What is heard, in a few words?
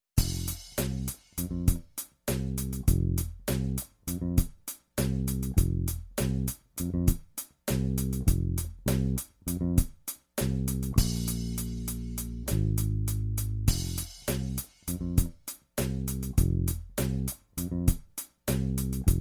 bass